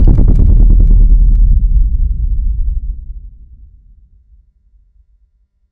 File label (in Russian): atomnaya bomba treyler